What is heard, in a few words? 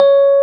RHODES C#4; AKAI S-Series CD-ROM Sound Library VOL-2